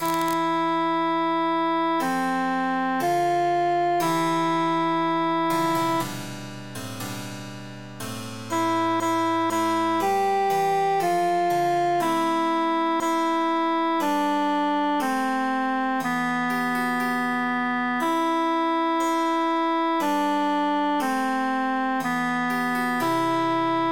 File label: altus emphasized; mille regretz